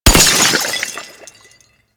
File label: Helmet Smash; Warframe